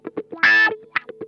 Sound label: THROAT WAH, Funk Guitar; Zero G - Funk Guitar